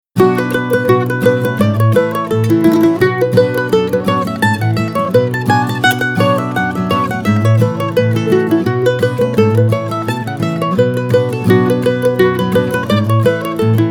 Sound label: Mando